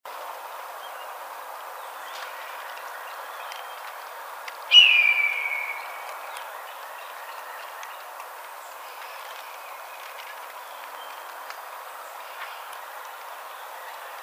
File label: Red-tailed Hawk